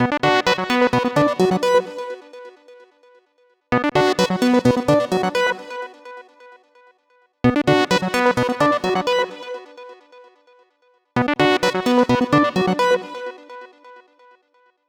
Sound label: 129 Bm Arp, Complex Electro Synths; Prime Loops; TranceOut